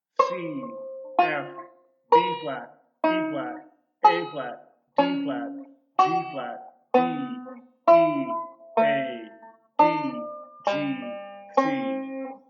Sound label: Roots Down 5 Up 4, chord melody; Beyond Chord Melody Volume II